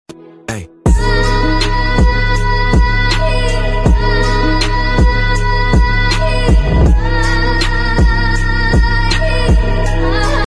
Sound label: hacker ringtone